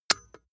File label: metal click